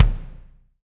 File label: BD, DR BD; Best Service Gigapack 2